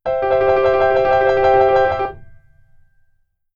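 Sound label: keyboard tremolo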